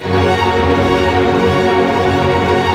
ARP F# MJ FF, STR ARP MAJ; Sound On Sound - Peter Siedleczek's Orchestra

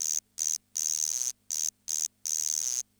CR78; Best Service; XX Large Most Wanted

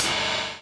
DOWN, DOWN KIT 78

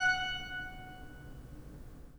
pipe organ, 3-D Audio

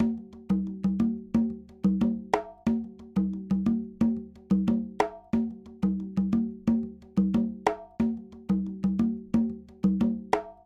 Conga, Latin Percussion

